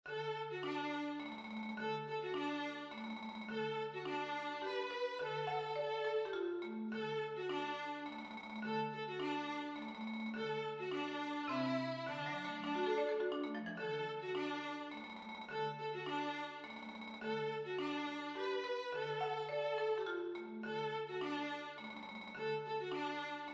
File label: duet marimba violin; Levende musik for boern